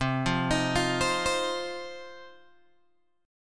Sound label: C Minor Double Flat 5th C down Guitar Open G